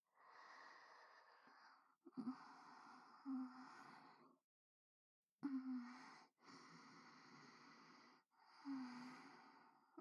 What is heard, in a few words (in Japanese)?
寝息ループ